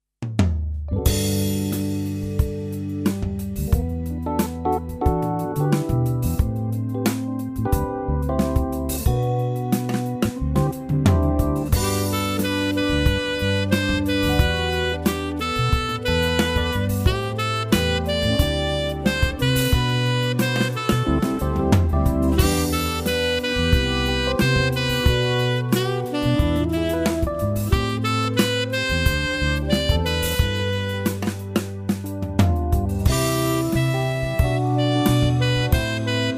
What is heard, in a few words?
Take me higher Tenor